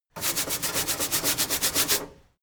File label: mechanic sink brush long